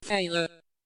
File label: Failure